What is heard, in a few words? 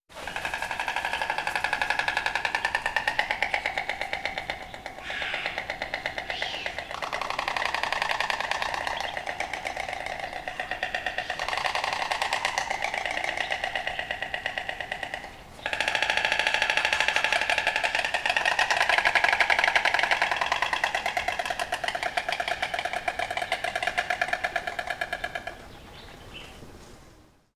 bird storks